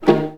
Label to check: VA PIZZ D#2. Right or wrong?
Right